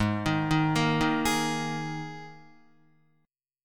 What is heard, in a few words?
G sharp Suspended 2nd, Guitar; arpeggiated; 3,6,3,3,3,4; 4F,4A,5C,5G,5C,6E